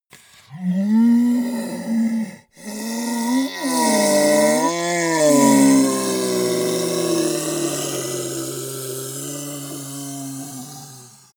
ogre dying groan weak slow

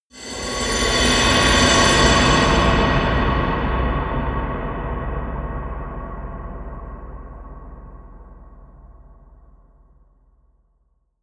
eerie industrial swell haunted; sweeps-swells

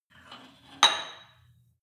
weight rack insert weight hard, fitnessstudio

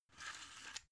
drug blister put in soft; arzt

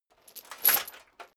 metal chain movement on plate single

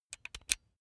electric drill bit insert; maschinen; bohrer; soundpool

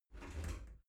shower slide door slide short close

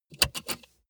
car bmw e46 interior safety belt clasp release

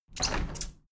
window handle plastic squeak open heavy; objekte; fenster

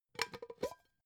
pot lid open; objekte; topf

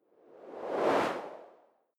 whoosh simple incoming; swooshes-swishes

